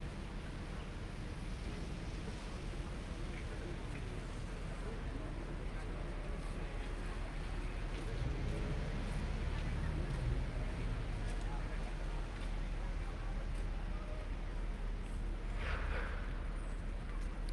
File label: madrid gran via up street firetruck pass by loud sirens slushy traffic pedestrians; fvtt sonniss gdc audio